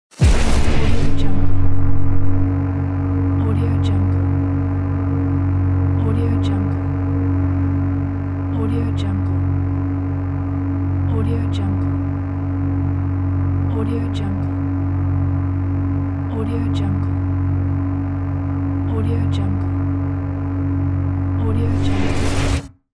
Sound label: classic lightsaber